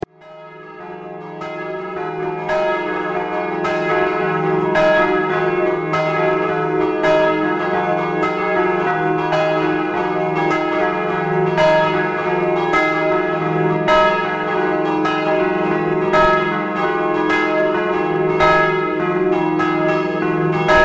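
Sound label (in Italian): SILVELLA scampanottata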